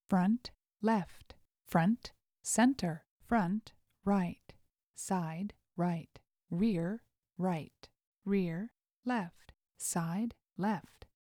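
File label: seven point tone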